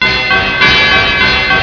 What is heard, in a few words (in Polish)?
cofanie, train